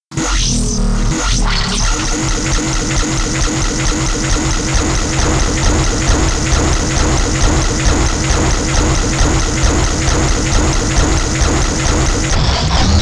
lolicore

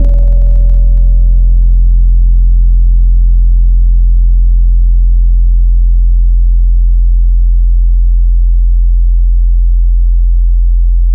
Chromatic Hit, Tropical Mallets; Synthetic's Serum Collection; SparkPackers

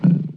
menu command; Windows 98; Windows Sounds; Dangerous Creatures

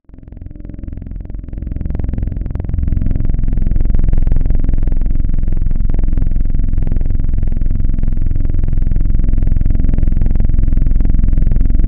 Flute; Synthetic's Serum Collection; XLNT-Skrilla Tables

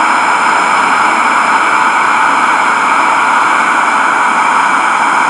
Vocal Noise; Chill Trap 2 Noises

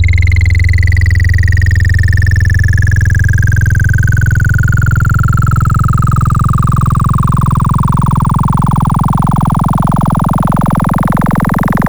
Warpy, Serum Wavetables; Synthetic's Serum Collection; Cymatics - Nuclear for Xfer Serum Wavetables

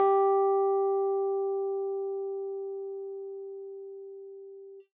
Rhodes G2; ESW Floom V.1

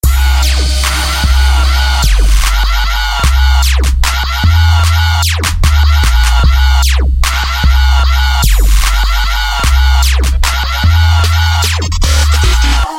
Moar Screams, lolicore

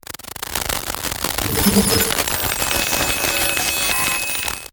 fuzz; mshusta; dorm funk; Eerik Inpuj Sound; lolicore

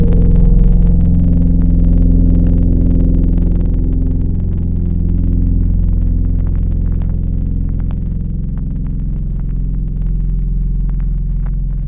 Caribbean, Tropical Mallets; Synthetic's Serum Collection; SparkPackers

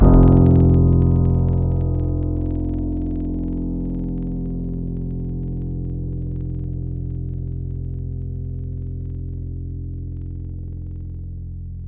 Piano Hi, wavetables; Synthetic's Serum Collection; Standalone-Music SYMPHONY Wavetables

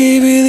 Vocal; Synthetic's Serum Collection; Splice; Singular Sounds; Future Bass Noises

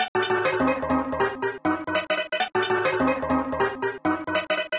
stab sequence; 'Go Fuck Yourself' samples; lolicore

